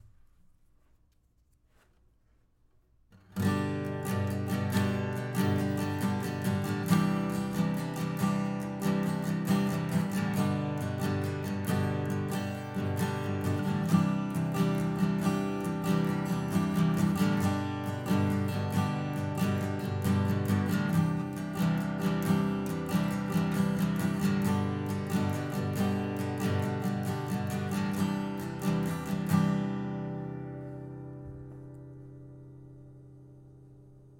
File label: old guitar; acoustic guitar